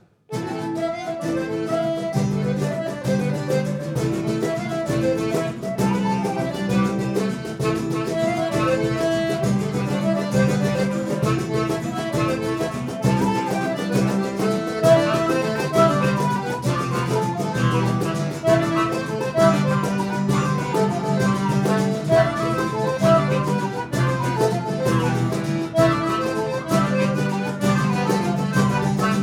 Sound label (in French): cercle circassien